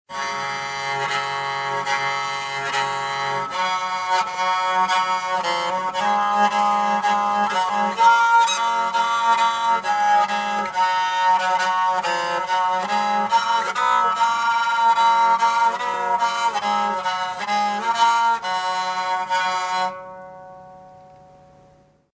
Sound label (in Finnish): Kanteleharppu